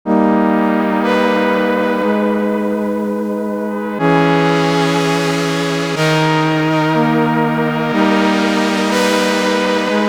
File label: Arcade G; basic wavez visions vol 4; u-he diva presets